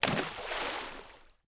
Splash Small Mono

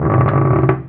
Upstairs wood; Devour 2021 gamerip pc macos

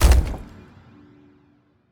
Object Place Wood; LevelEditor

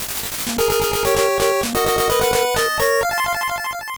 Game Over

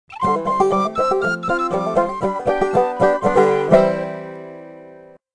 America Music; Civilization Revolution

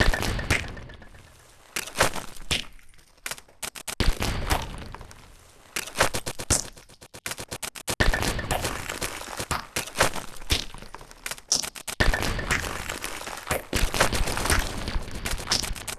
Fridge Music Beats; wii gamerip; mushroom men the spore wars

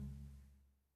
Loop Tail; Good Job

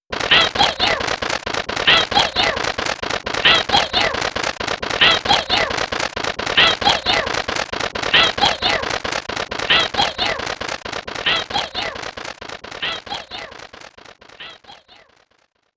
Parrot(I KILL YOU); Clock Tower 20th Anniversary Sound Collection

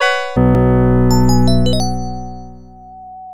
Jingle Item Get!! Item Get Jingle; Shining Force Genesis

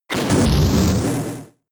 Fire Punch; attack moves; Pokemon; Gen 4; DPPL HG SS

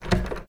Door Locked

